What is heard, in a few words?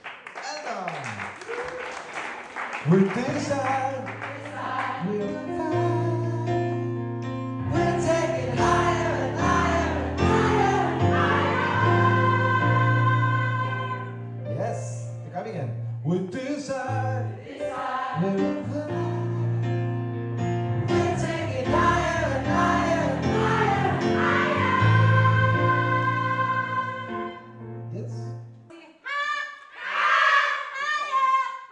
brand new start refresh alt